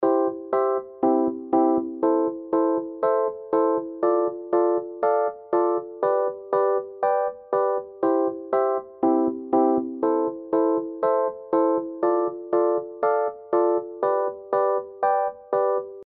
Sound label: music